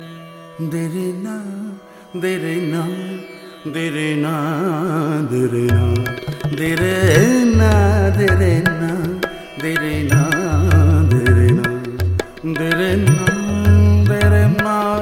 Tabla; Idea Cellular

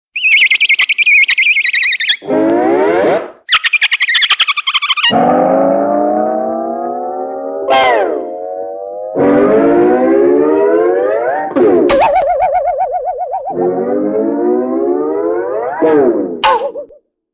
Cartoon Sounds

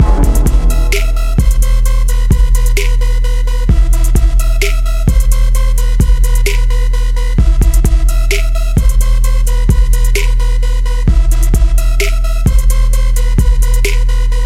TRAP BEAT HIP HOP

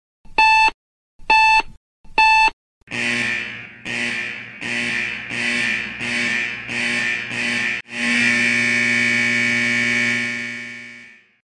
Handy Alarm

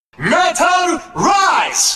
METAL RISE

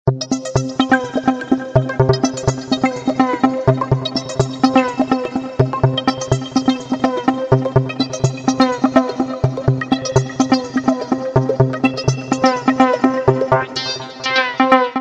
Static Rmx

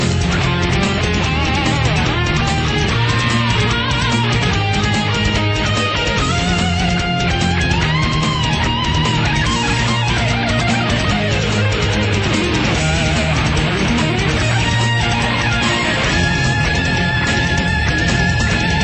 Metal; Contra

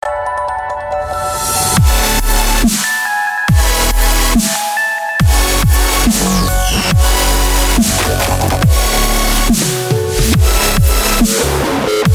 intense TONE

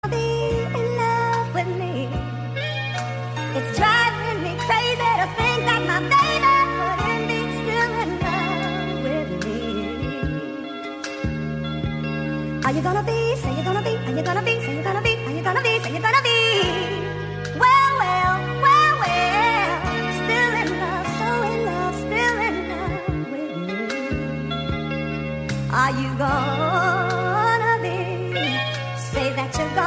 Slow Jams

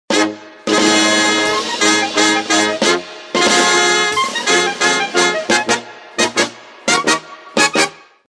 Nice Fanfare Ringer

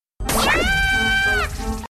Scream; Chibi Italia

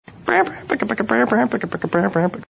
Cute Noise

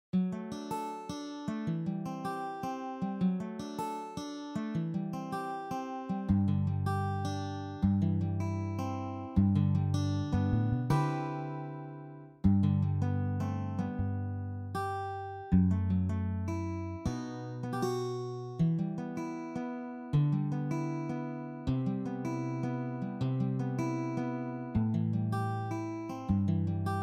Guitar wake up

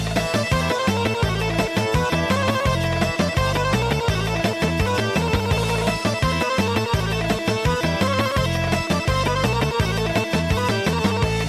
Balkan Turbo Folk

